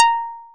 plucked, programmable speaker

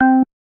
bass, programmable speaker

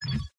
deconstruct cancel end; Factorio

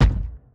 build blueprint medium; Factorio

